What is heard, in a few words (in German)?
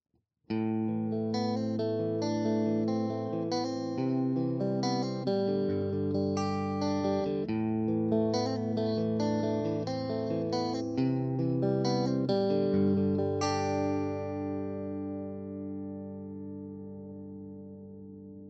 di fender 59er strat h+m; Line 6 Variax JTV-69